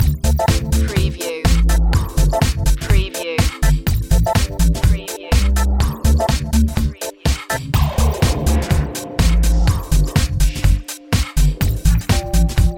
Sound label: feel the beat loop